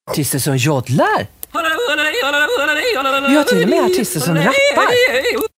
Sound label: joddling